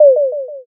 m message

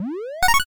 cabinet boot

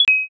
pos

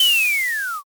brake

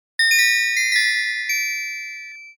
wind chime far

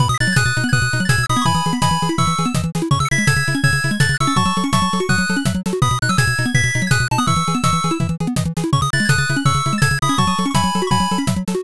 bgm drive

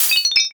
dish break

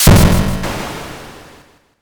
thunder magic

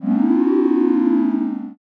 ooh

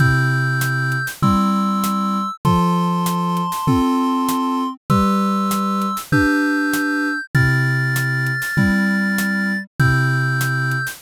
bgm

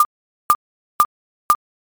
thinking time

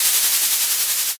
balloon inflate

